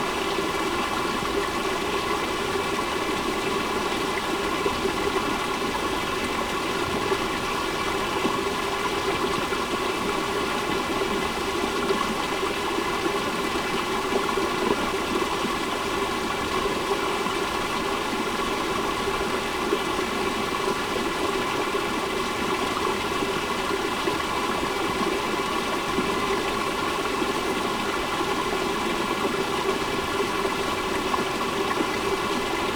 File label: water; mishima